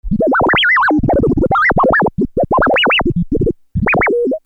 SCIFI

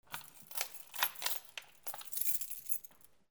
DOORS

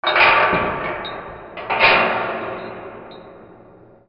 BANGS

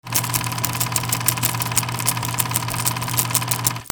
CAMERAS

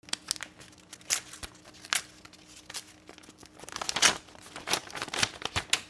OFFICE

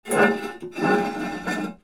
BATHROOM

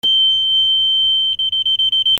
ALARMS

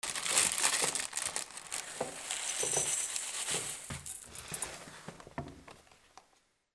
KITCHEN

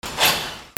INDUSTRY

